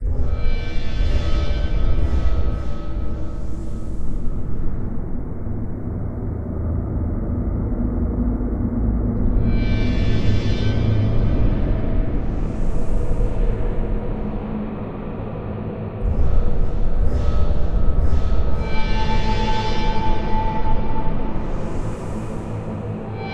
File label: Ambient ice Loop; kholat gamerip 2015